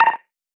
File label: short end